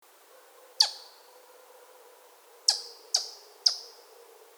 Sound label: squirrel voice